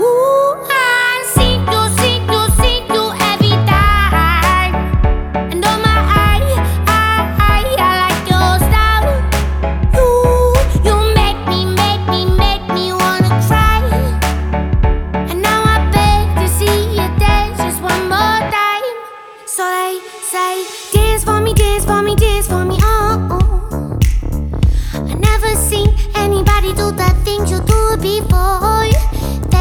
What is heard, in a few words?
English Song Ringtone